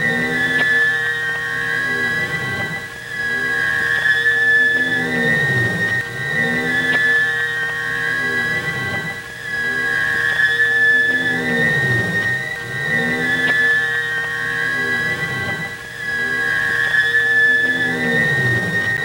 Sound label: Undulation; Sound FX